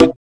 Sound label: Percs